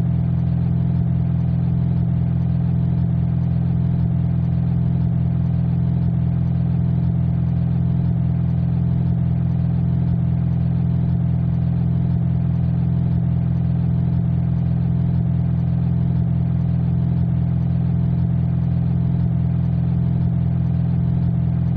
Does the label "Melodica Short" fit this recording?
No